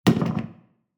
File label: Setting glass on wood table; household